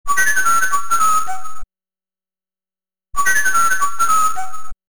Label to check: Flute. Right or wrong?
Right